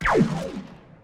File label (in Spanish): disparo; invasion espacial